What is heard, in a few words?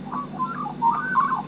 chirp; MagpieH